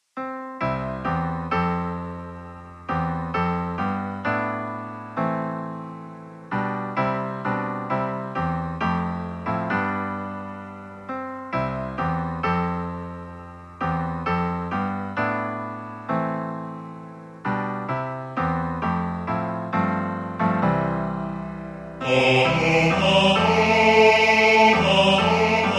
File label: Tenor; I Heard The Bells